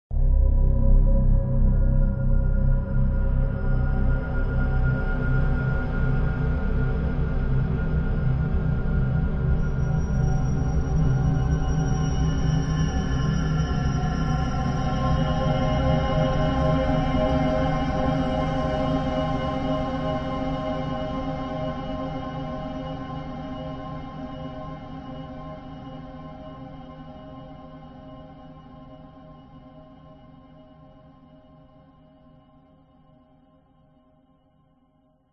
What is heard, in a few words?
horror atmospheric drone eerie sinister tense ominous